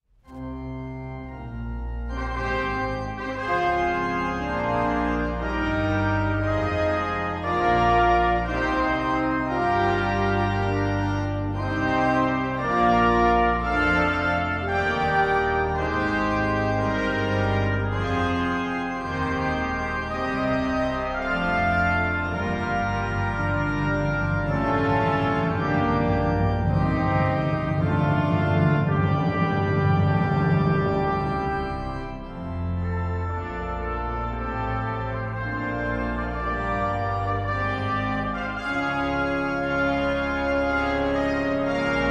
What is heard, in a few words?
processional fanfare; hillert